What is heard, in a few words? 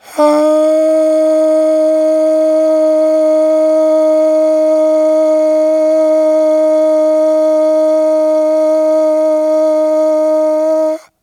AHHH, Vocal; Polyend